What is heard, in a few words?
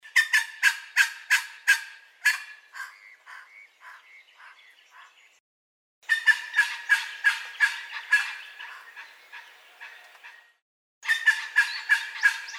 bird lithgow call sequence